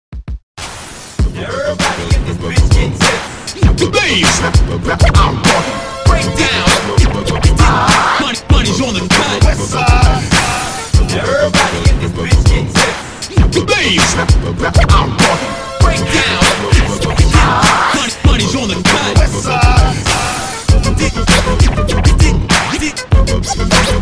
Scratch Redrum 90

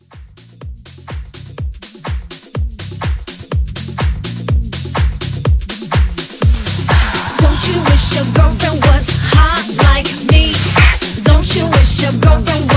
House Blend

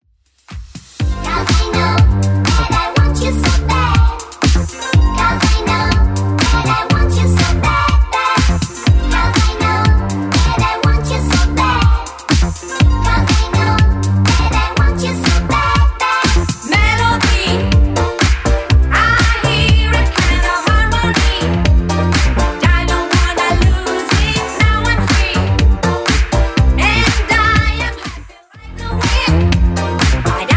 80s Club 122bpm

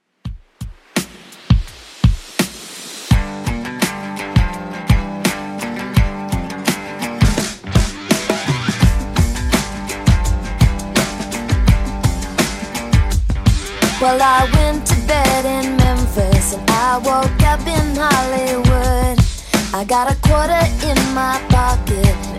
2Ks Rock Redrum 84bpm

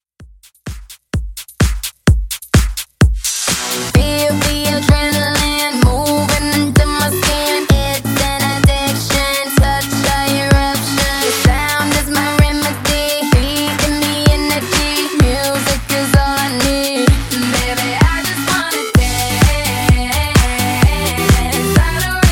Throwback Party Redrum 128 Bpm

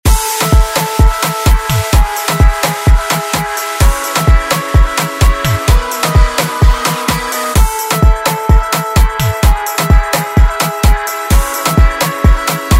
Afrobeat Redrum

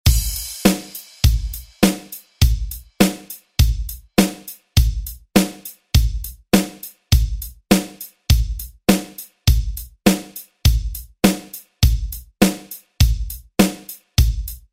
90s ReDrum; DJ Mhark

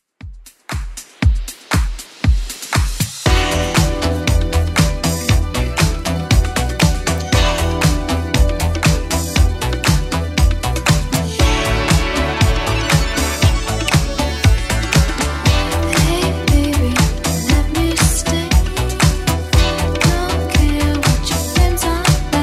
80s Club Edit 118 bpm

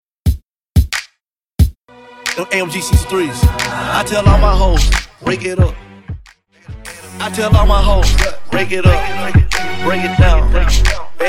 Mashup; Redrum

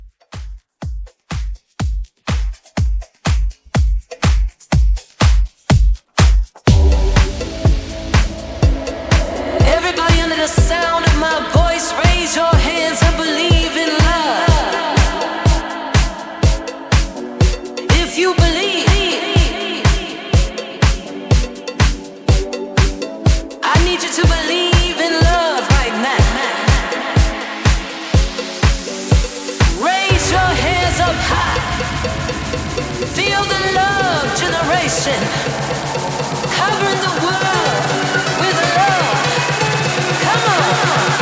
Club House

